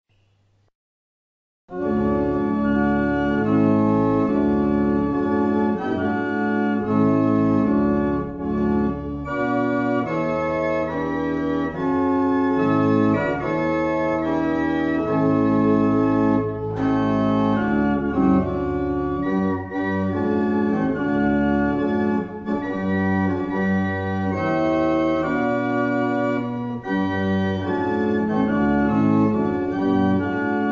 Mount Ephraim, barrel organs; Mattishall

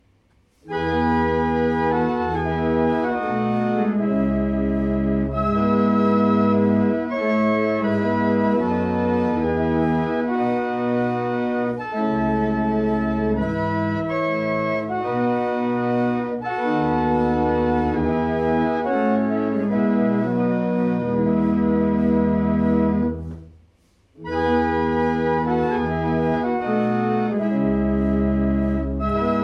Double chant, barrel organ; Stockland; Mornington

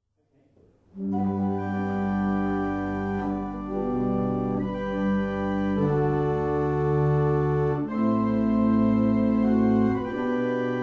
Single chant, barrel organ; Quantoxhead

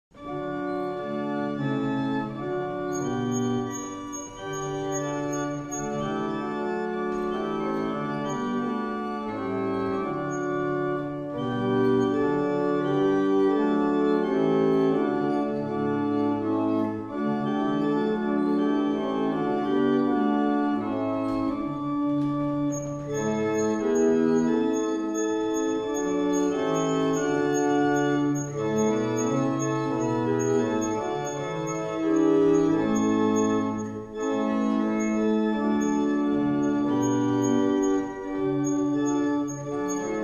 Hymn, barrel organs; Wissington; Easter Hymn

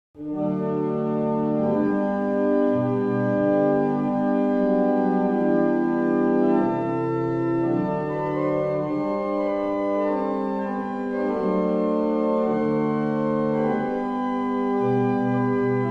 Evening Hymn, barrel organs; Avington

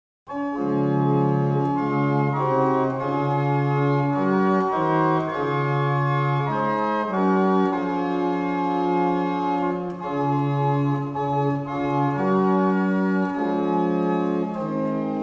barrel organs; Tytherley; Duke St

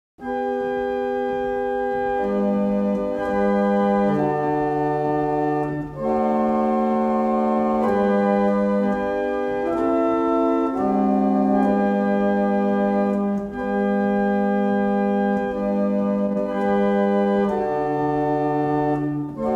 Single chant, barrel organ; Wardley; Battishill

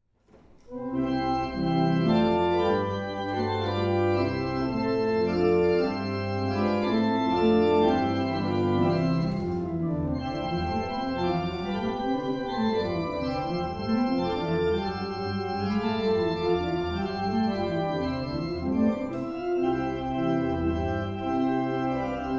National Anthem, barrel organ; Lopham

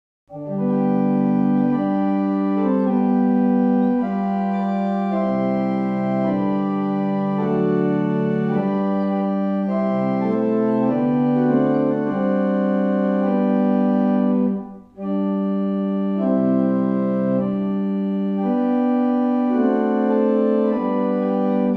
barrel organ; Llanfair

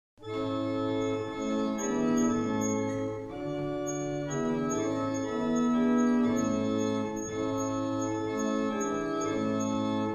Single chant, barrel organs; Wissington

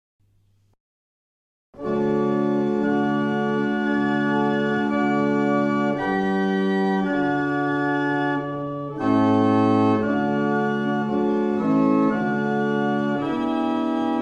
Prague, barrel organs; Mattishall

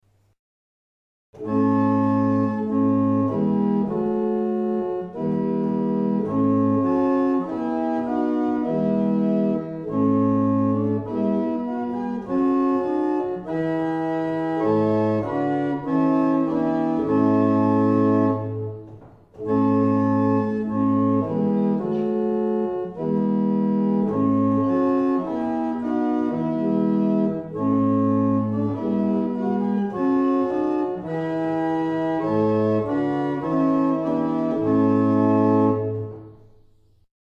Double chant, barrel organs; Mattishall

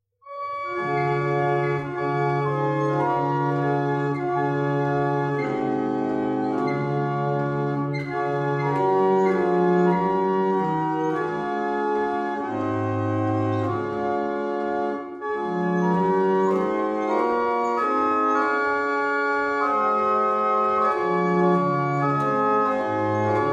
barrel organ; Bradley Church

